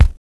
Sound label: KICK; Kick 64 (Same sound as DMX Kick); Oberheim drum sound chips